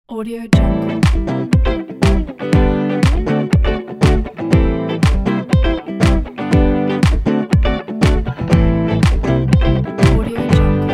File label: funky groove